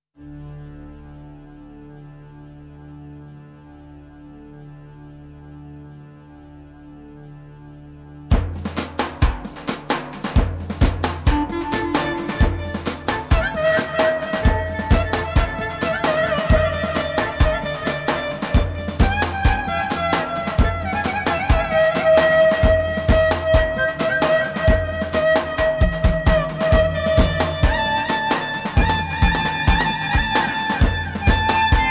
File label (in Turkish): ince calgi, tyros 3 trakya ince calgili